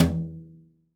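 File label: brush soft; Northstar - New Gold VOL-2; 30S BRUSH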